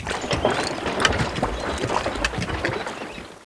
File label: CLAY PIT; Caesar III